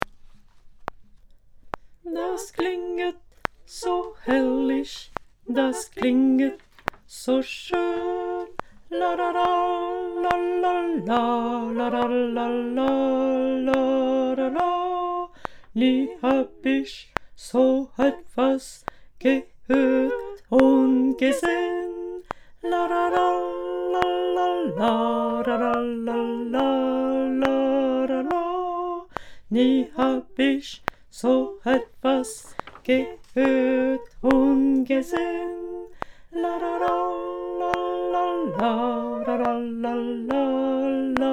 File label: Tenor Basse